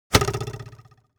BOW Arrow Hit; Medieval; SmartSoundFX

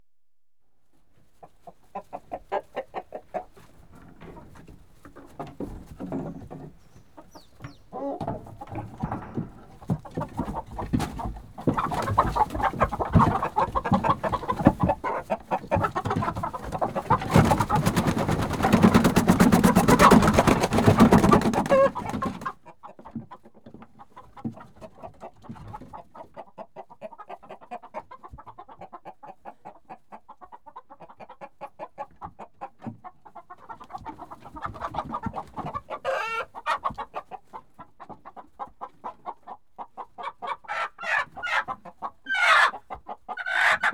Hens Disturbed In Hen House Close; Livestock; BBC Sound Effects Library

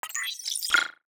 confirm beepy; SoundMorph; Users of Tommorow

